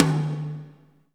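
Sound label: tom, real mega drums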